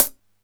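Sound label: hat closed, Alesis DM5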